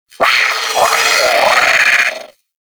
Sentient Brachiolyst Attack; Warframe

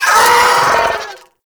Vocal Pain; Warframe; Sentients; Ortholyst

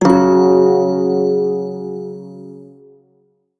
Shawzin Chromatic Chord; Warframe; Prime Shawzin

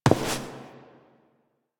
Step; Warframe; Koumei

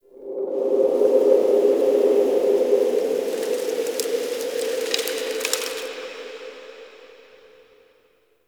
Park Wind, ambience; Warframe; The New War; Zariman

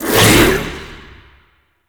crack cast; Warframe; Khora

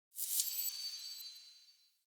Progression Burst Particle Sound

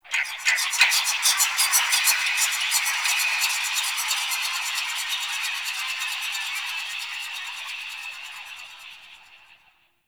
Possessed Texture, Ambience; Warframe; Shadowgrapher

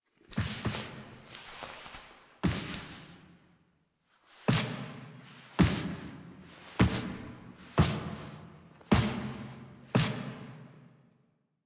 Return Foley; Warframe; The New War; Cinematics; Orbiter Return

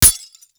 Helmet Impact; Grn Chem Nox

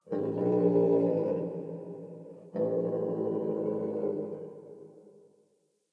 Forest Open Glade Creature Far, Day

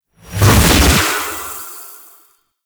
Equip Impact; Noctua; Warframe; Dante

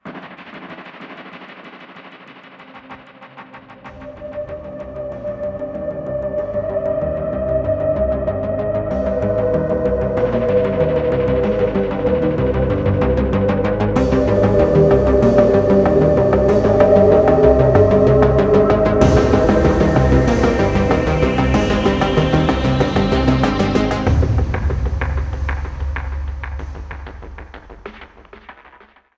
Amb Cue; Hubs; Syndicate Perrin Sequence; Tenno Hubs Perrin

